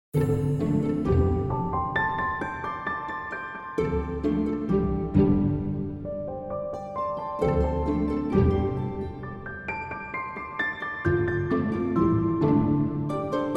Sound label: Dulcimer